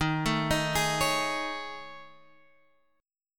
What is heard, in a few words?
A Major 7th Flat 5th